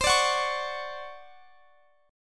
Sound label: D Major 7th, chord; Dmaj7/C; strummed